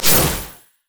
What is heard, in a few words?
lunge; LoL; fiora